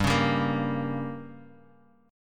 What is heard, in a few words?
G Diminished, Guitar, Drop D; 5,4,5,3,x,x; down